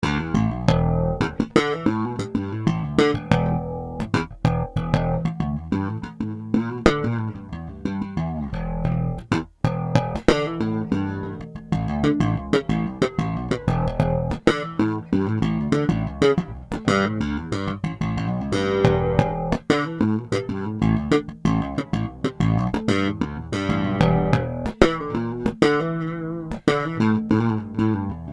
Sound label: E Slap, Bass